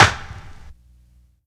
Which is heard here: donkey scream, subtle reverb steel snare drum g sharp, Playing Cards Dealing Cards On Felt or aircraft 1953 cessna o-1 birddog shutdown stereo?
subtle reverb steel snare drum g sharp